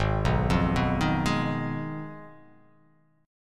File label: Ab Minor 6th, Ab; Abm6 arpeggiated; JGuitar